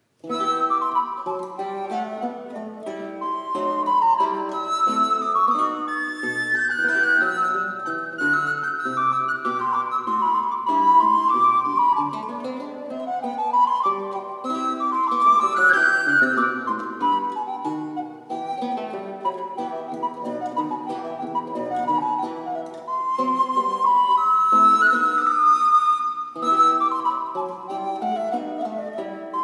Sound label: Sop, recorders; Coolen; Terton